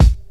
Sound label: hip-hop bass drum sound A key, steel kick drum; 2000s original